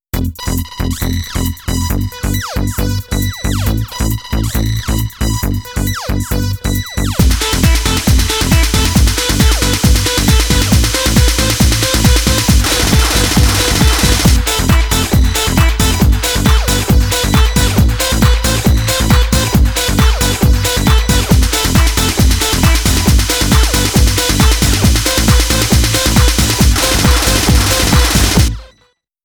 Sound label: killing techno